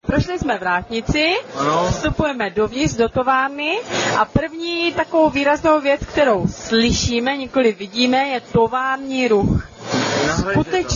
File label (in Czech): tovarni ruch